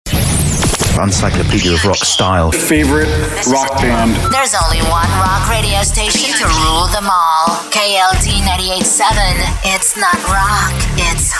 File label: ROCK Radio